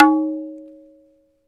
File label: tabla na o